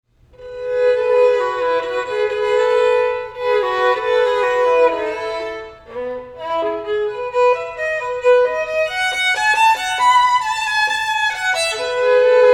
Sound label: bouree; partia no3